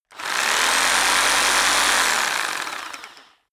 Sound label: rock drill hammer rev very long; maschinen; bohrer; soundpool